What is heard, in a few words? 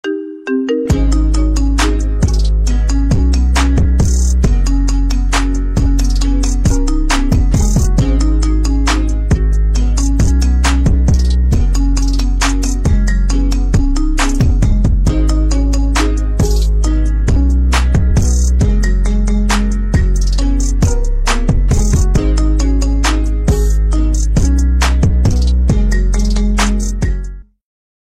Marimba